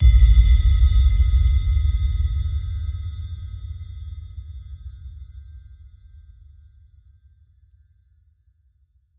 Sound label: Magic Cheer Dreamy Boom